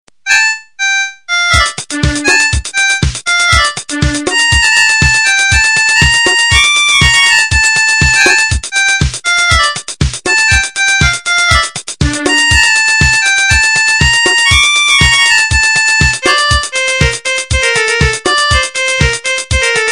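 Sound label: Arabic Tone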